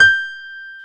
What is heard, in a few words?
PNO, Electrics, Grands; Club-50 - Foundations